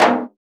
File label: Dofs Rim, Roland BK-5 Percussion